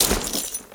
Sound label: Skell Soldier Cloth